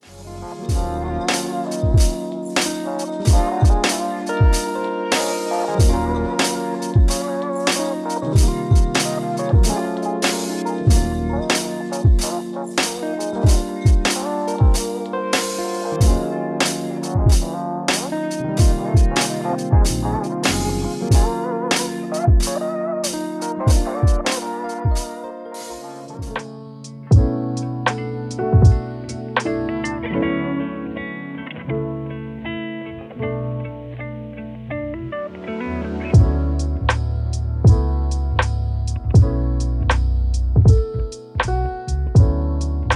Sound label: BeatStrumentals